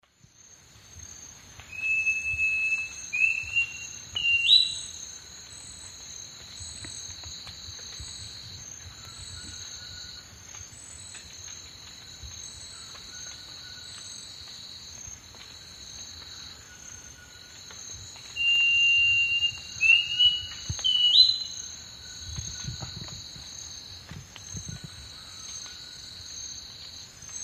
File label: Schiffornis turdina, song; S. America; Eastern Lowlands